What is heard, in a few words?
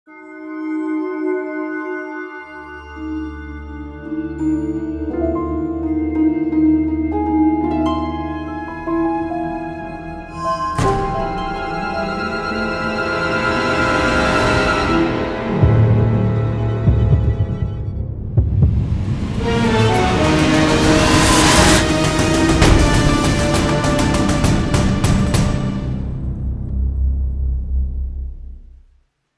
Dobro FX